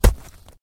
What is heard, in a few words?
Snowball hit; weapons; cstrike; cwapi; byMayroN